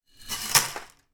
raw dry spaghetti tip out of glass jar onto surface